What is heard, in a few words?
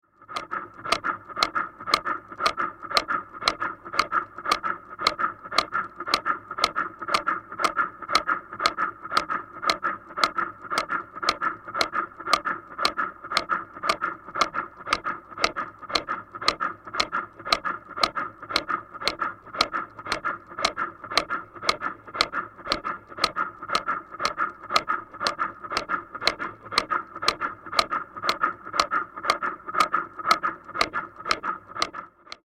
Heart Simulator; On, Run Steady In / Out Breathing With Light Bellow Inflate / Deflate; heartbeats